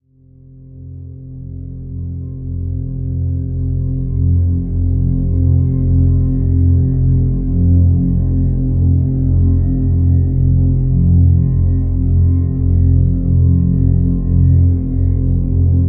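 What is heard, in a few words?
drone F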